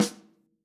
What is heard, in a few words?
Yamaha Holz L hand